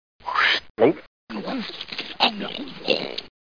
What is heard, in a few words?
woosh inwards